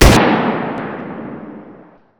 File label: kar98; WAR